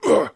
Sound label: Wound; Voljin